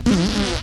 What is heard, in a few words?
fart short goofy